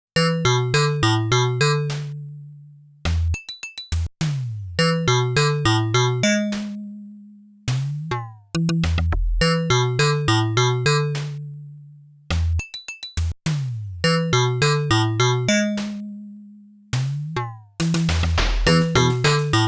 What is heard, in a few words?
PC-8081mk2MH Version, 8bit; Gate 変移空間のオクテット; 8bit Original Soundtracks; MEC PC-8081mk2MH